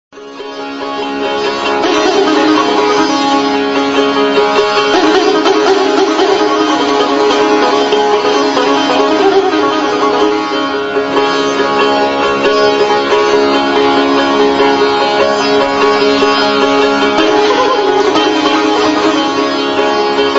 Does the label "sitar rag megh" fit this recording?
Yes